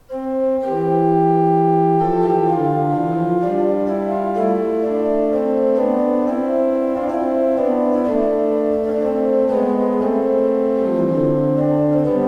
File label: pipe organs; Paul Ott 11 II P